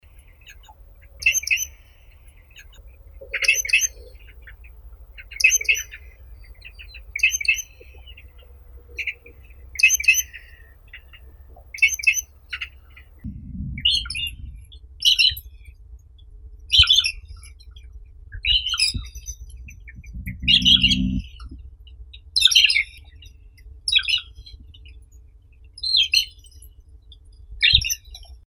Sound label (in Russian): zvuki prirodi sorokoput klinohvostii lanius sphenocercus